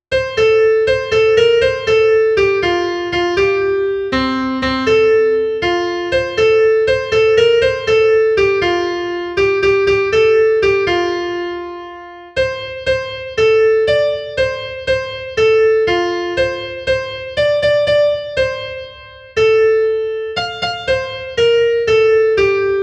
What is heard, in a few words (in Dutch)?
melodie